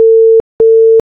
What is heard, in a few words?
ringback